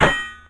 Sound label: clank